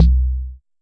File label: Jomox MBrane, drum machines; Jomox MBrane 11